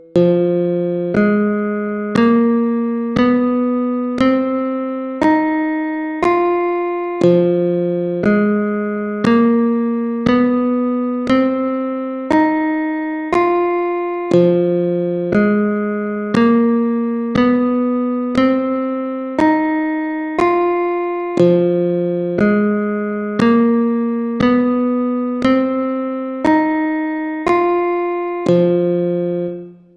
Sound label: F Blues Scale